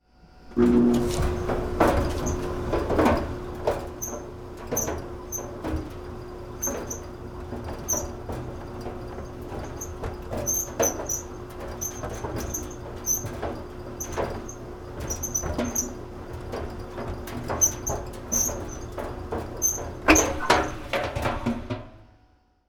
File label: trainers in dryer